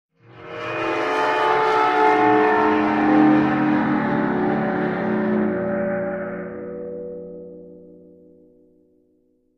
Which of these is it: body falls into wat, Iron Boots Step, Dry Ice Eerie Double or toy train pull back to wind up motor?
Dry Ice Eerie Double